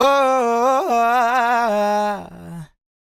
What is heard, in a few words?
Ad Lib Dry, 140 BPM F Min, Vocal; Cymatics; Euphoria Vocal Sample Pack; Ad Libs